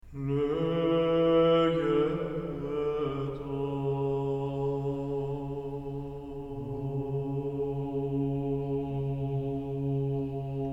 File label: ensemble of old church music; ensemble of old church music sretenye; to thee we sing